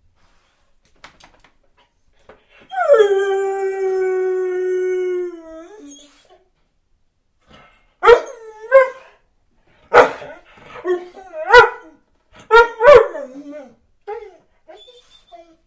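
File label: sounds with dog howling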